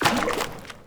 paint blob splat; Portal 2

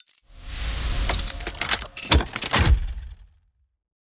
case unlock, ui

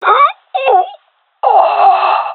death, gendermerie fem; vo

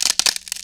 wood strain; physics; Counter Strike Model Pack; CS:S

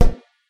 rubber tire impact soft; physics

